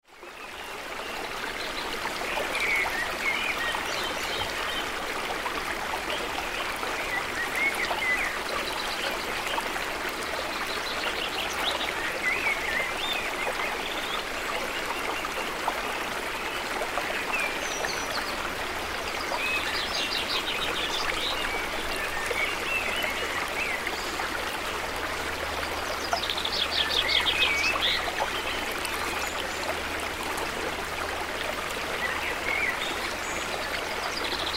Forest ambience nearby fast river with birds in the trees